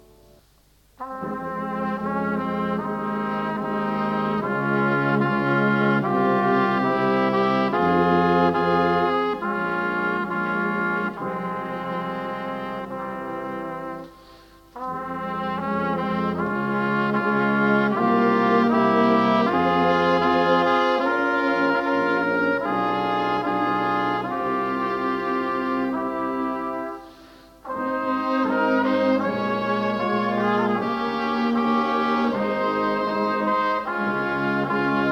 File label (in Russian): Музыка (духовые); Братское - Воронеж